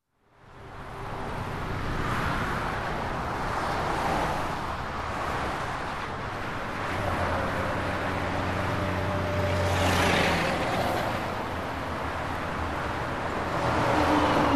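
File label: noise, hum of highway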